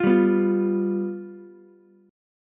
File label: F Suspended 2nd Flat 5th Ukulele, chord; strummed; 10,7,7,8; JGuitar